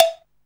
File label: HR16 Cowbell; Drums; Alesis Hr16